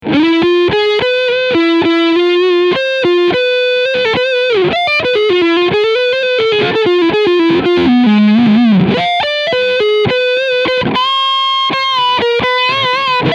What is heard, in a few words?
Ibanez RGA60AL Leadsound Front Pickup